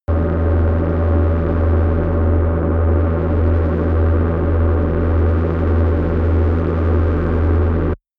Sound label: spaceship engine; raumschiff